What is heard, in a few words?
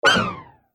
Multimedia Swipe, transition